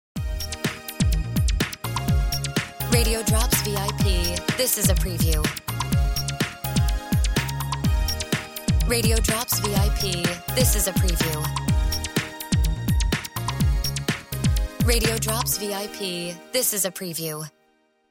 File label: Music Bed